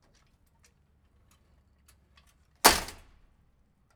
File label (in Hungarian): kertikapu